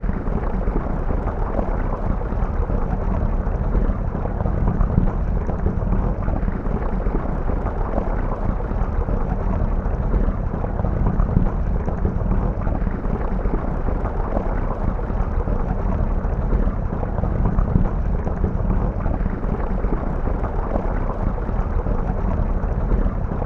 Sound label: nature water underwater pouring into bath tap running constant